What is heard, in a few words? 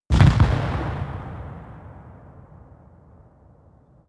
expl close er, explosion; World of Tanks Blitz